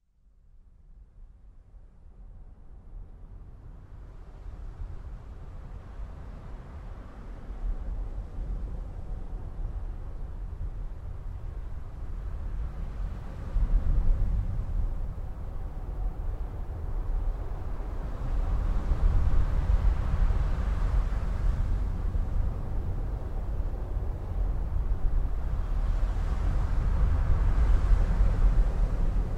Binaural Beats Meditative Ocean Pacific Surf with Theta Brainwaves